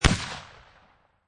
Rifle Thompson Center Encore 209 Muzzle Loader 50 Cal Single Shot At 45'